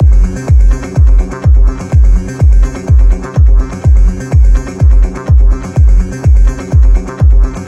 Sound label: Synth Loop 95 Gb 125bpm